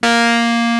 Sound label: Rhodes Fuzz A#3; Mo' Phatt; Digital Sound Factory Mo' Phatt